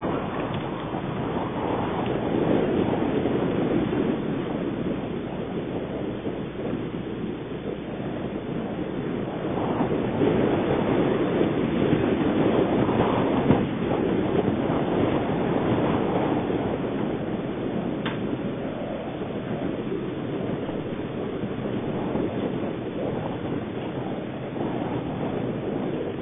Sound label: wind medium light gust; de egypt